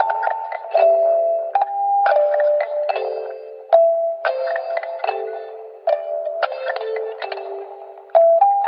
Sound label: music box clockwork; Loops; Electronic